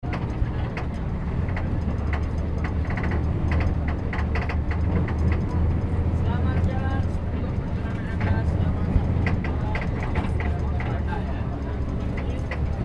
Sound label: ambience car, Indonesia